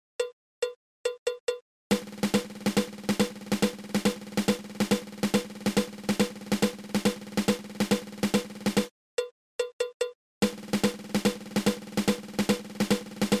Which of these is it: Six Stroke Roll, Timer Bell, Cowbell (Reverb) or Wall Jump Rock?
Six Stroke Roll